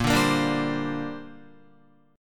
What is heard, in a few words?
Bb 6th Add 9th Bb Guitar; Bb6add9 strummed